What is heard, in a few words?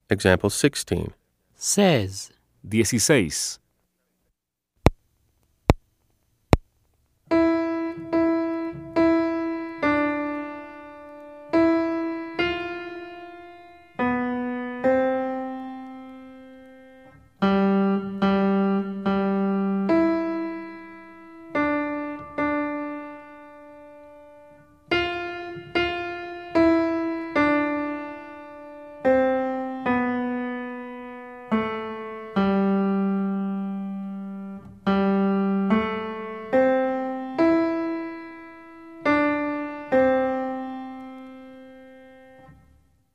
Keyboard